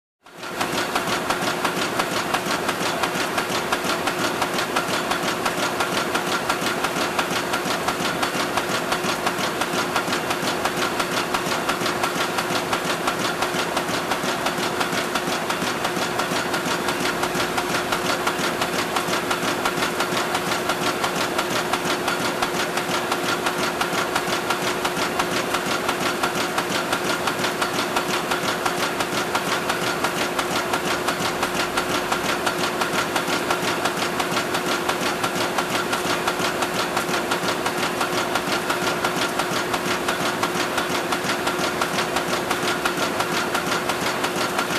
Industrial Mixer

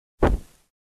Inflame

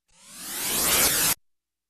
Noise Sweep Riser

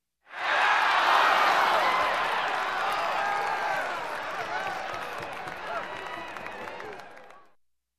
Crowd in a sports game cheering

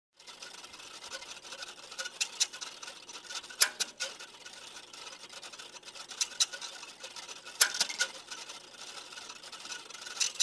Electrocardiogram printing on paper

